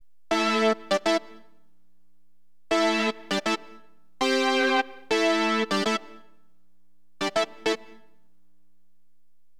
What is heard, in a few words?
JUNO, Keyboards; LiveAct Pool; AcidJazz Funk